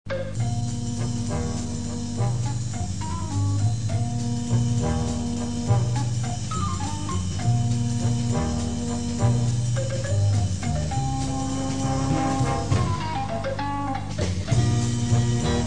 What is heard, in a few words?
theme